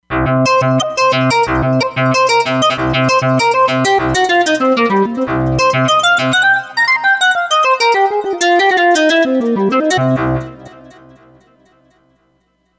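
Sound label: Digital Lead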